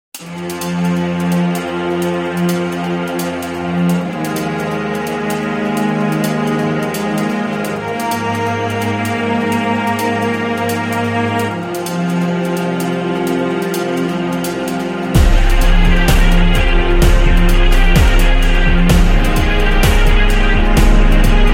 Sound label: Violin Future